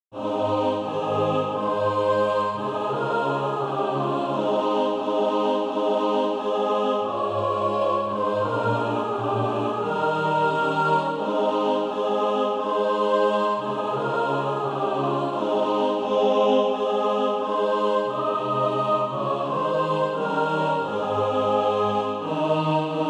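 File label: mixed, SATB Practise Music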